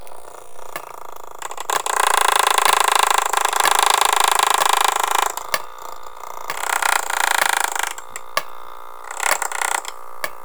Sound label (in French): marteau piqueur; suez